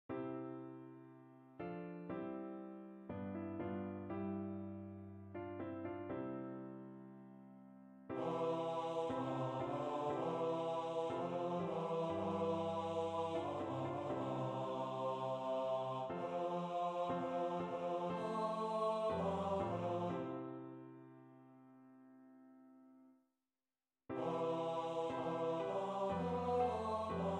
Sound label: Tenor; A prayer for Ukraine; Second Tenors; Ukraine concert